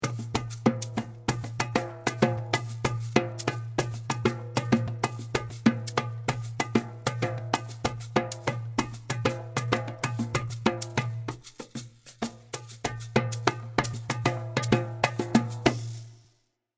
Bonse Aba Accompaniment

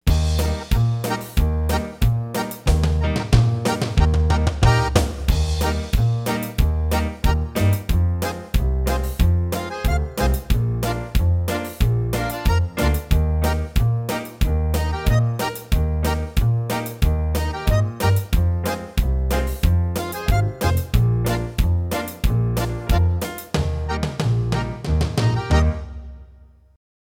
Oom Pah Band Slow

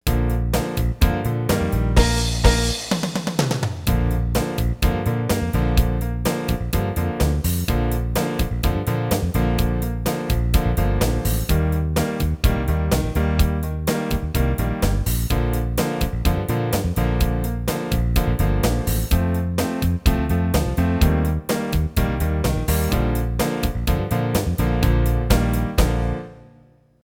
First Blues Bb

